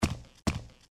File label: bounce